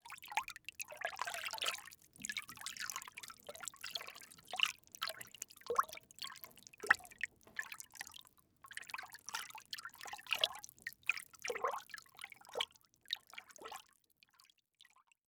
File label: Liquid Water Movement In Metal Bowl Finger Swirling